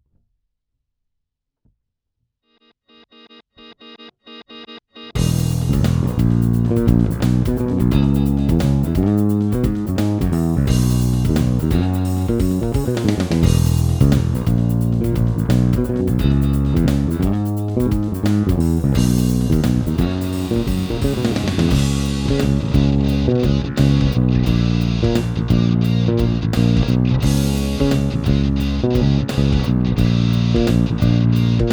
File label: Groove & Solo E Lydian, guitar